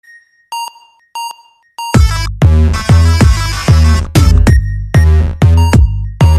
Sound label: message ringtone